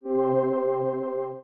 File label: string